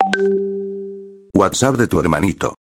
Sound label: whatsapp; pikkuveli